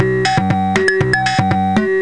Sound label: Samba; FreezeTag PPC 1.2.1